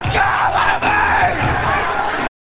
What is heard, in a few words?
crybaby kid; crybaby match